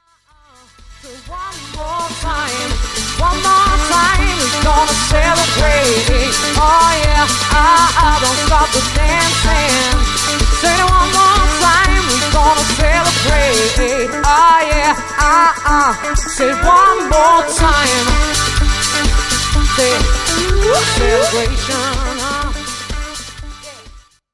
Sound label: sax vibes party band